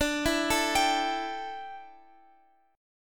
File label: D Suspended 2nd Suspended 4th D, down, Guitar Open F; arpeggiated